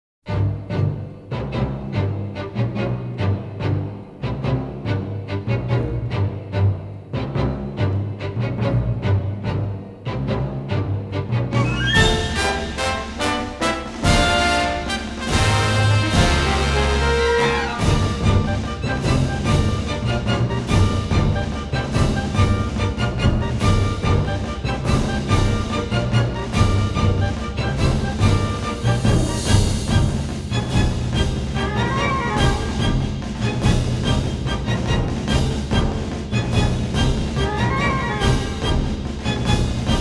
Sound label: Field Battle - Large; Nobunaga's Ambition Ultimate Collection